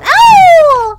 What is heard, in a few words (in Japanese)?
悲鳴